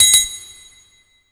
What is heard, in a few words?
DRMS, Underground Hip Hop; USB Soundscan vol.02; AKAI